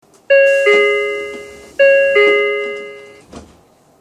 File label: shinkeisei8800-a, door